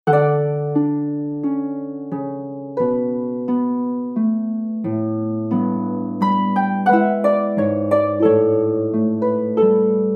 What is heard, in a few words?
Harp; Air On the G String